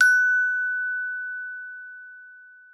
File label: F#4, GLOCKENSP S; Xsample VOL-4